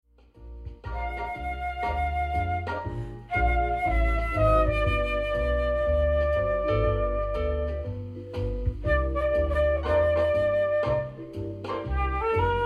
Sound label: jazz, flute